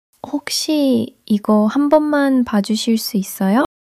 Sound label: struggle